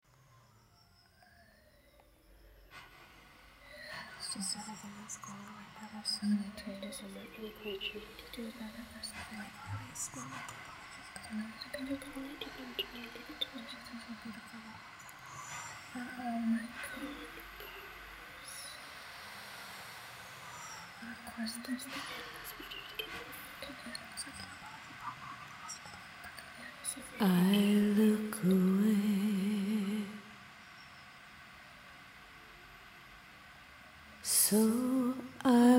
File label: Vox; Look Away